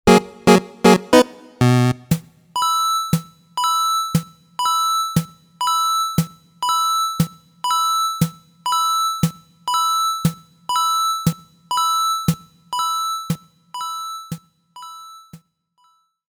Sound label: Coin Block SFX